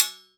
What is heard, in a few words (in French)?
casserole; In the kitchen; 96khz 24b mono